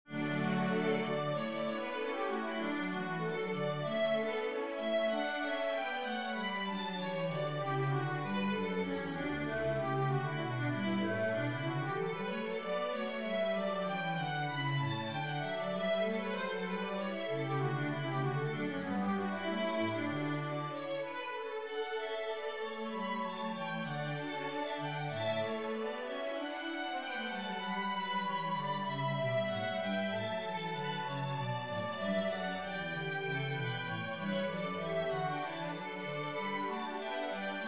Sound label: Brandenburg Full